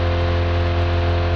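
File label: truck diesel engine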